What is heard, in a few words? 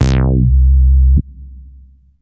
Fat bass, synths